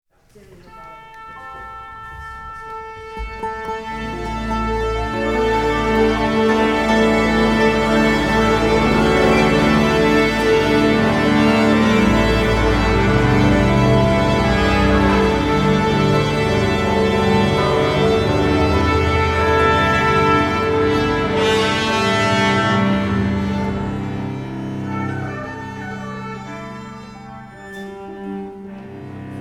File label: Orchestra Tuning